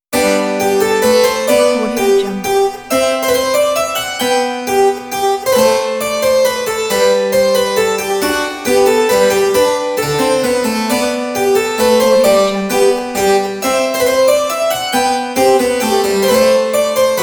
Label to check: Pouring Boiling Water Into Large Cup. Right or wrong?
Wrong